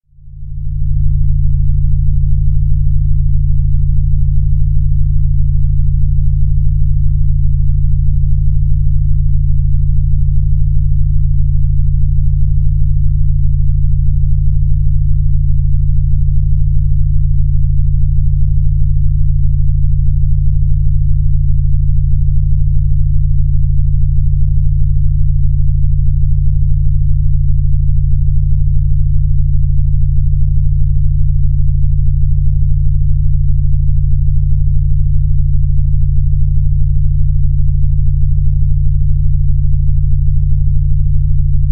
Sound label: Brainwave Enhancer Theta (Dream, Meditation)